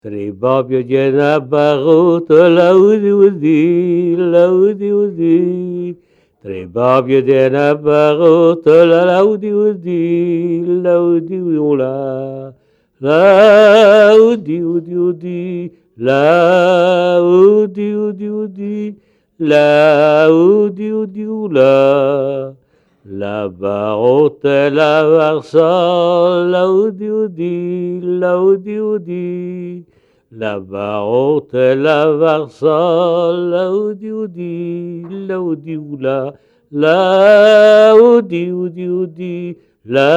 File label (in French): 3 gones dans une brouette, Chants en francoprovencal; francoprovencal de Rhone-Alpes; MUCEM; à Domessin (Savoie) en 1997